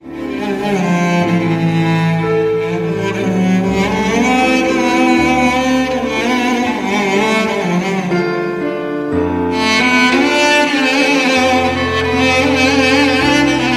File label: Classical music